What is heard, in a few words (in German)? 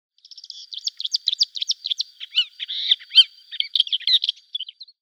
Schwarzstirnwuerger Lanius minor; Vogelstimmen